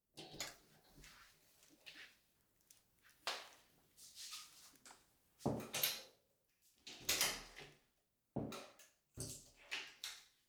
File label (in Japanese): トイレに入る; 動作系